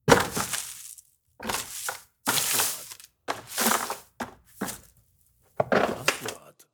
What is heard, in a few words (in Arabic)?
خرخشة; sound effect